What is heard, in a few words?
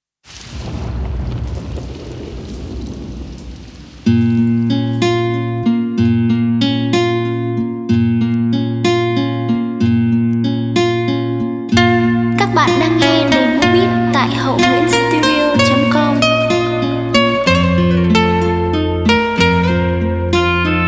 beat, playback